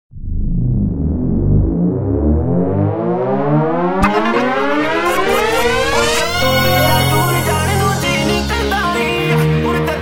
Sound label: Dj Spin Panjabi